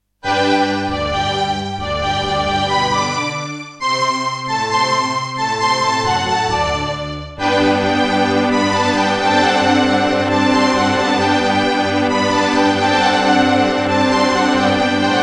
Serdaco McQub; Mozart - Eine Kleine Nachtmusik partial; classical

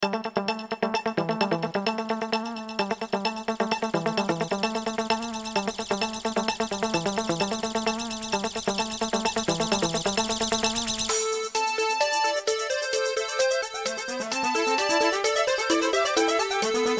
Creative SB AWE32; System Shock

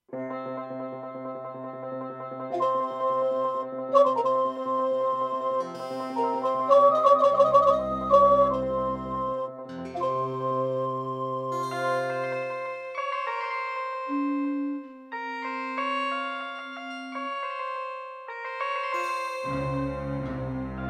Intro, Ensoniq VIVO 90DB-A; Prince of Persia 2 - Intro

